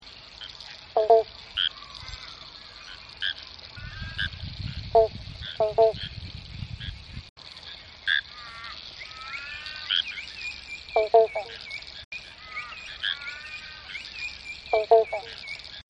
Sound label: pobblebonk